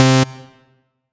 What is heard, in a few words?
BASS